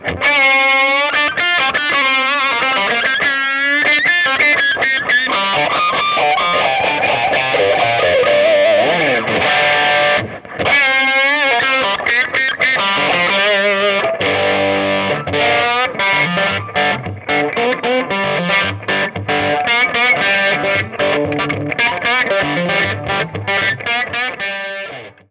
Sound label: Crunch strat B pickup Gain 14 Boost on Treble 10 Middle 13 Bass 12 Master 13